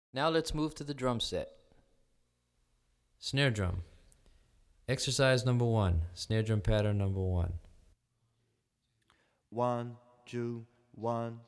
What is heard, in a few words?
Samba - Snare Drum Drumset; Brazilian Rhythm For Drumset; DaFonseca, Weiner